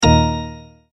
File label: Multimedia Tone-Stop